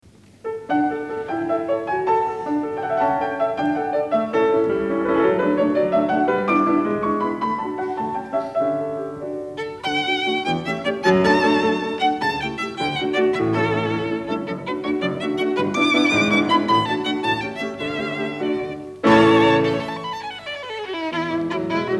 Sonata No. 1 in D for Violin & Piano Op. 12 No. 1 III. Rondo; Beethoven